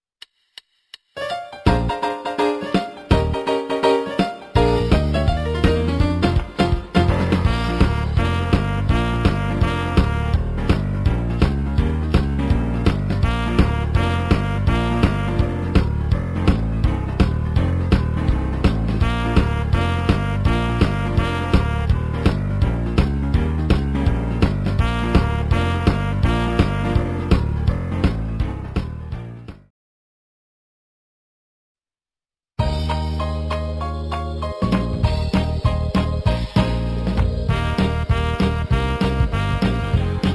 Brass Samples

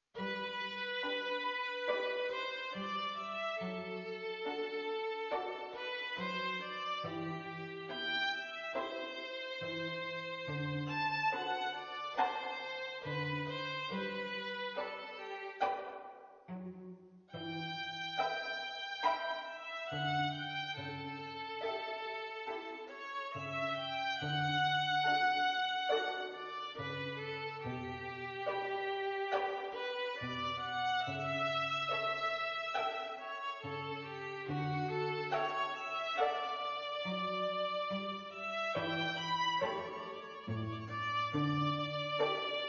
String Quartet; Arioso

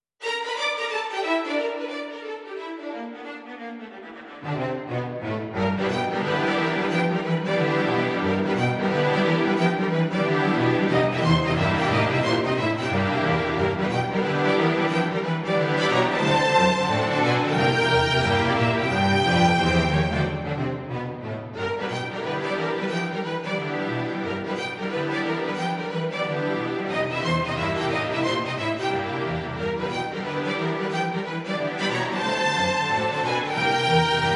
Boogie - String Orchestra

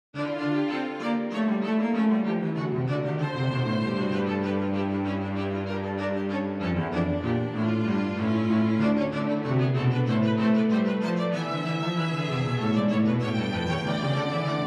Concerto for Two Violins in D Minor; Vivace

